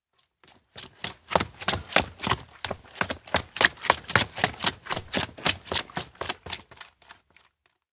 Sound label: steps outdoor town rain run; anemoi